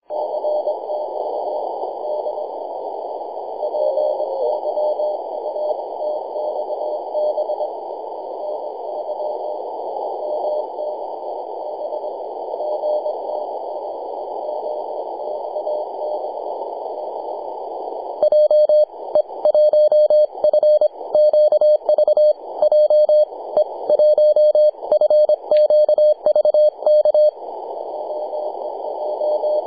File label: 3.505MHz CW; ja0rug